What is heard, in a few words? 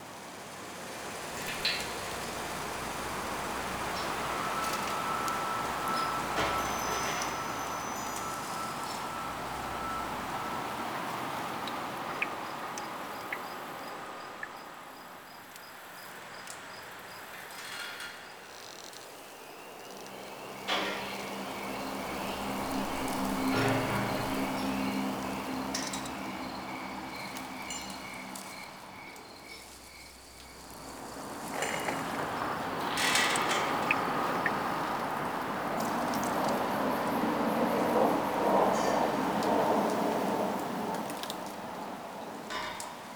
Fall Exterior Small Bed Loop, Ambience; Warframe; Nineteen Ninety Nine